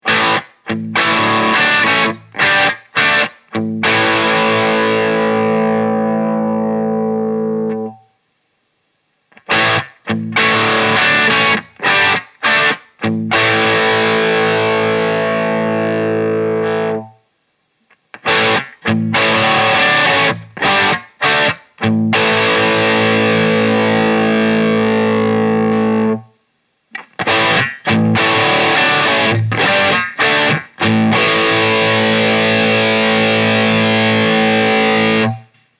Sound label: Crunch Tube Stages